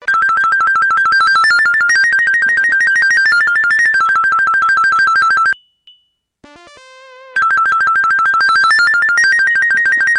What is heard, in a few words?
ring; Angel Island